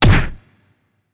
Enemy Kick; helltaker

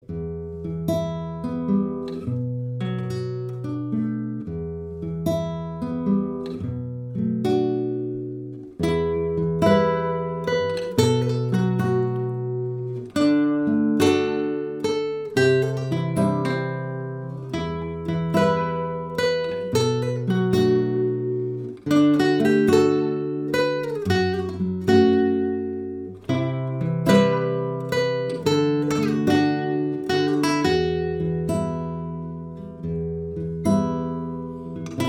Bard Instrumental Loop

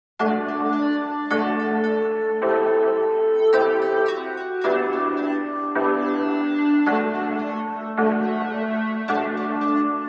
Retro; anonymous original sound track

